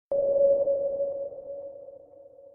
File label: Low sonar underwater